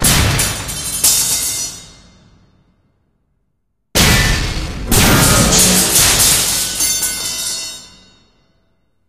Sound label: stair destruction; Source Engine; Portal 2